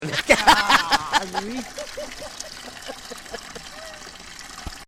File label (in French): rire